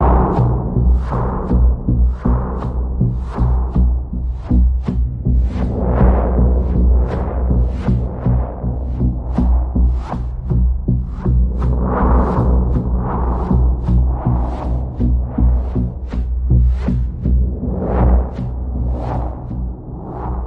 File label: Pulse, Key of B